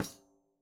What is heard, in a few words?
daf Single hit; Slate; SEISMIC Drum Sample Pack